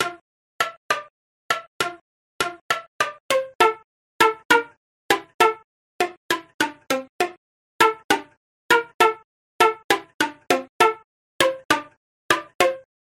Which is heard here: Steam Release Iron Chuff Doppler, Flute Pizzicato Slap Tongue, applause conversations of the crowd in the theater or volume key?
Flute Pizzicato Slap Tongue